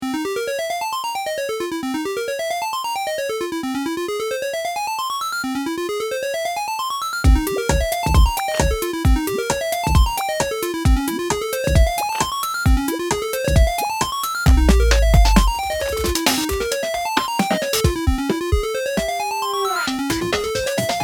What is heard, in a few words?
synth Sample